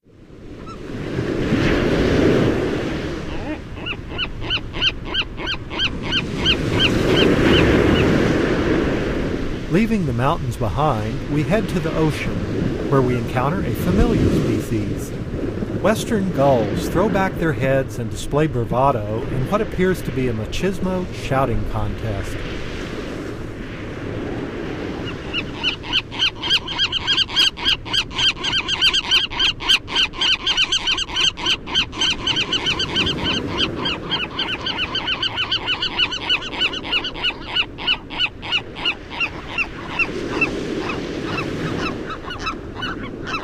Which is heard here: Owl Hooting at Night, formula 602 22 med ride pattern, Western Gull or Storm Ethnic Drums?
Western Gull